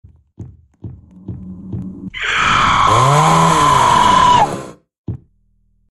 jumpscare; roblox piggy; robby

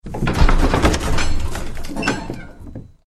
door cellar gate open

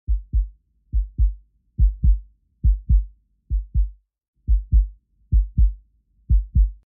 heart beating sound

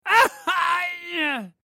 hurt sound hard version; dwight fairfield

